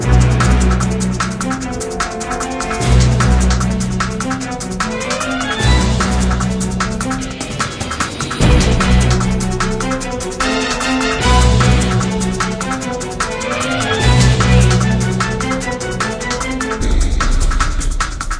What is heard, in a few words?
Music Minigame; cartoon network punch time explosion xl 2011 gamerip